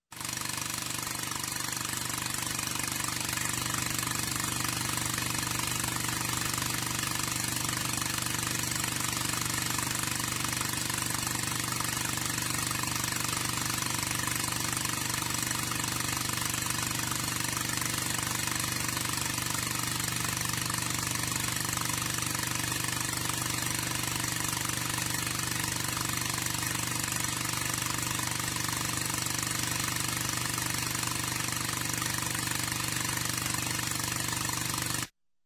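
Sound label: engine running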